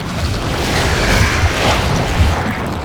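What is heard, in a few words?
carpet bomb effect; rumble skin03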